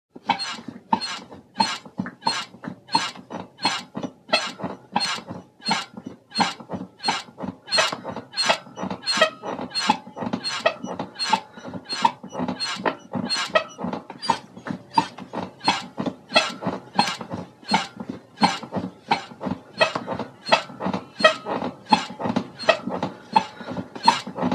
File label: Bed Creak Squeak Beds